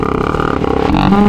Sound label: Boar, Wavetables; Cymatics; Serum; Animals for Serum - Gold Edition